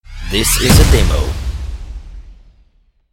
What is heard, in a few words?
Swoosh Crash